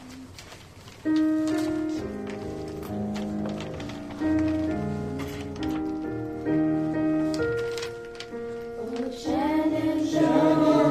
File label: Shenandoah